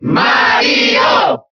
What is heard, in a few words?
Cheer Italian; Mario; SSBB